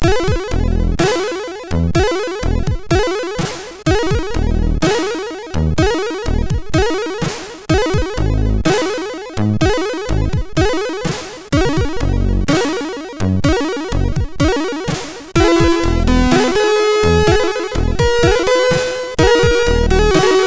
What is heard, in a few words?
Classical 2, MOS6581; MOS6581R2